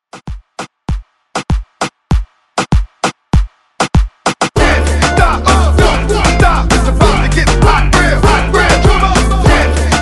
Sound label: Hype ReDrum, 98 Bpm; DJDOLLS